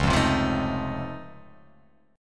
C sharp Augmented Major 9th down, guitar chord

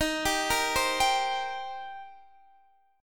D sharp 6th, D sharp, down, Guitar, DADGAD

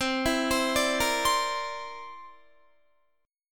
C 9th, Guitar; C9 arpeggiated

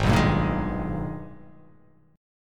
Ab Minor Major 9th, down; AbmM9; strummed

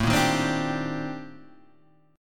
C Minor 9th, A, Guitar, Standard; strummed; JGuitar

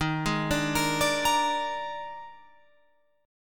Eb Major 7th Suspended 4th Eb, Guitar, Open F; EbM7sus4 arpeggiated

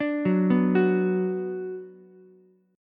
B Minor, Ukulele; Bm arpeggiated; JGuitar

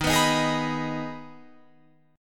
Eb Suspended 4th Sharp 5th Eb Guitar Open F; Ebsus4#5; strummed

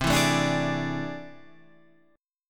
A Minor Major 11th

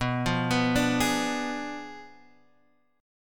B Diminished 7th B down, guitar; Bdim7 arpeggiated

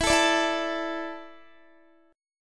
E 7th Suspended 4th, Guitar; E7sus4; strummed; x,19,19,19,16,16

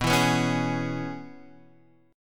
E 6th, B

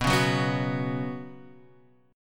B Suspended 2nd chord; strummed; JGuitar

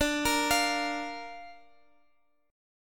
D Minor Sharp 5th D Guitar Open G

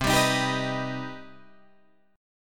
C Minor Major 7th C Guitar Open G

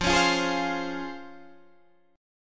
C sharp Major 7th, F, guitar chord

